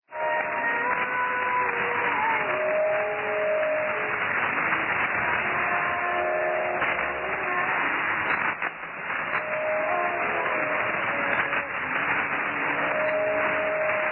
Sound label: shortwave pirate radio, odd QRM; Blue Ocean Radio; 6925U; Canklecats Shortwave Pirate Radio Collection